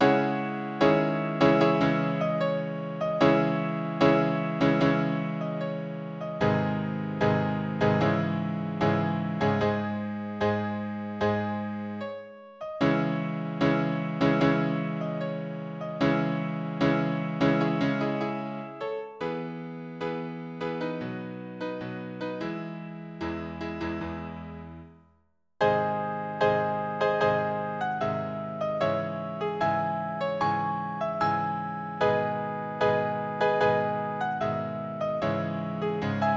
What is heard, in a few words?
Funeral March; Major Ringolds Funeral March